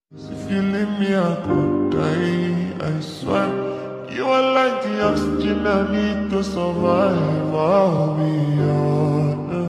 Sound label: sad alone emotion bgm; sad status aesthetic video